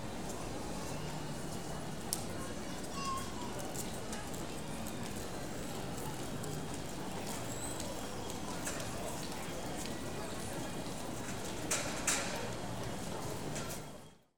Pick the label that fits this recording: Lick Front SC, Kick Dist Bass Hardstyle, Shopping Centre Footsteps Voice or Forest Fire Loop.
Shopping Centre Footsteps Voice